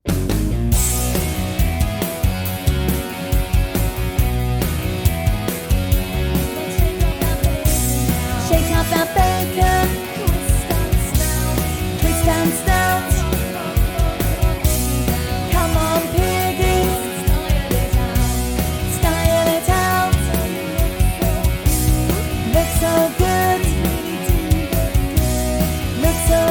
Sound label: low backing